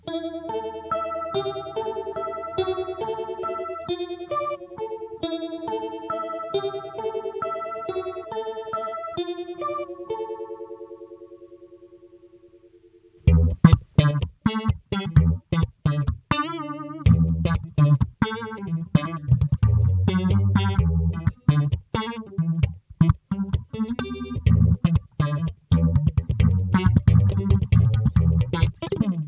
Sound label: strat neck fast; coron phaser55